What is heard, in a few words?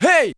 RX5 HEY; Crypton - DrumBase5000